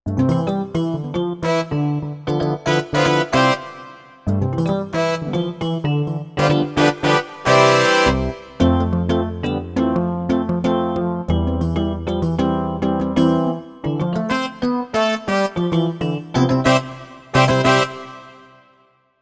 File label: Salsa Band